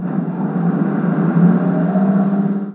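external diesel power cart start, Aircraft A320 - 321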